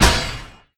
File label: sword pickup